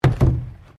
PLASTIC BARRICADE IMPACT; Material components for constructing more complex sounds

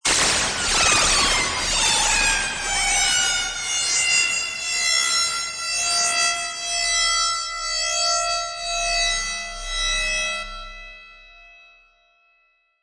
Fried Lzr, Blast from a lazer cannon; Cannons (Sound Effects:War)

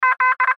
Radio Morse Code; Morse Code Letter Z; Morse Code (Sound Effects:Electronics); Blastwave; Warfare

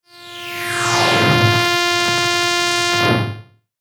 Electrical Descend Hum Static; Blastwave

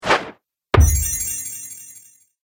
Dagger Throw Magic Hit; Magic Sound Effects

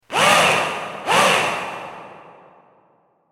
Electric Screwdriver; Power Tool Sound Effects

